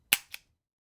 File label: Revolver Trigger Pull; SoundsCrate